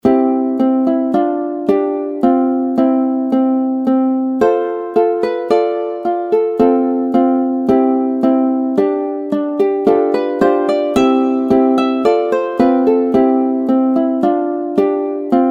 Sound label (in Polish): wojenko wojenko ukulele